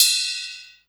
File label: CYMB, Drum & Bass, 135 BPM; Ueberschall; Drum & Bass Resonance